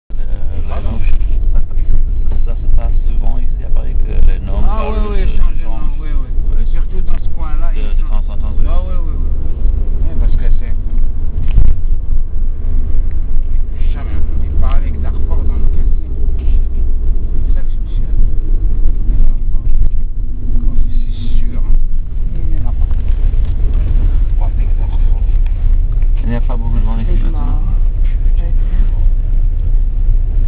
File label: taxiing